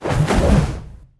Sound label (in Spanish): barrido; luchadores